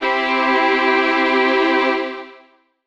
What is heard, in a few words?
Real String Chord, Old Skool House; Big Fish Audio; OSH Chords